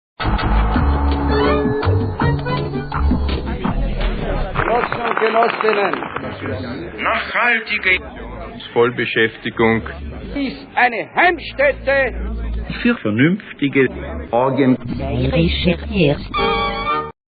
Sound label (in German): der jingle